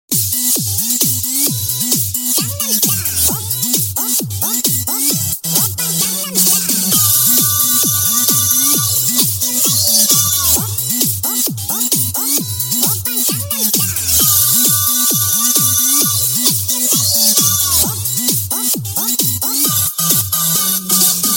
Mouse

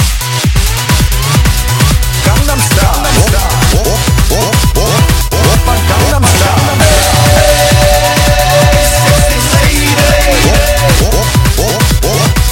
Artificial echo

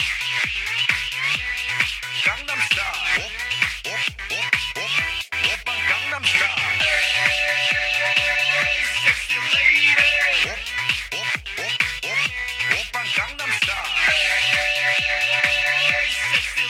Walkman headphones

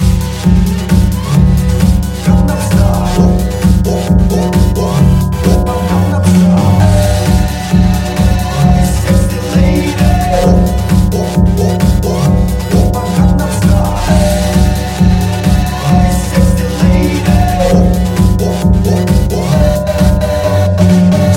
Underground

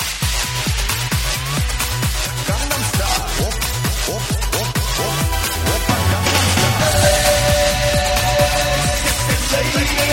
Hall

